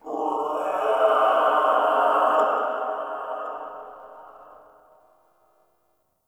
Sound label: Covered Vine Area Tube Scream; Ambience; Misc Outdoor; Warframe; Infested Eris